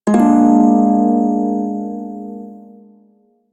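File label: Prime Shawzin Minor Chord L; Music; Warframe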